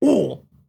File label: Oof; Foley Library; People Sounds; Let's Learn Everything!